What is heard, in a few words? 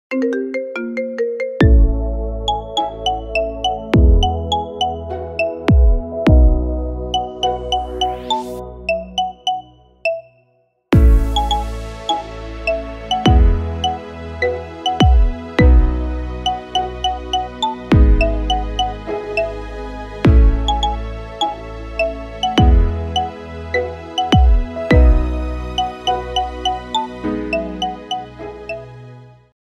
Marimba